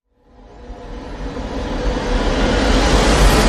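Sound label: Reverse Added Intrigue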